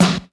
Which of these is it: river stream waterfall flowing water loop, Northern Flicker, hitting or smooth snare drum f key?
smooth snare drum f key